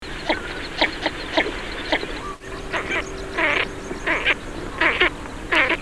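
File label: shirokonoska golos, northern shoveler voice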